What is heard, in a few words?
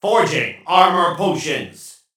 Forging Armor Potions; Realm Royale; Warrior